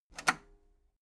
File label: metal lever button radio disable medium